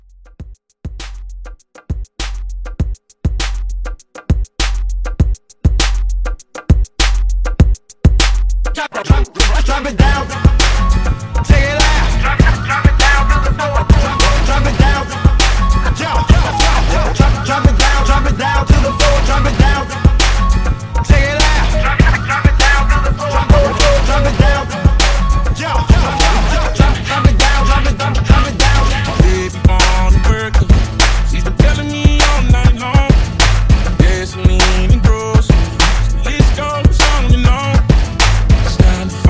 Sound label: Uptempo Hybrid Hype Re Drum